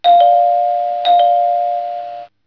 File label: doorbell; GammaAge